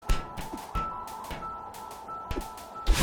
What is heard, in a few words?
celeste sound climbing metal